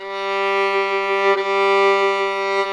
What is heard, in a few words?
VLN G2; Sonic Reality; American Heartland